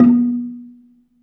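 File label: Balafon B2, Asian Traditions; Soundscan vol.60; AKAI; BELAFON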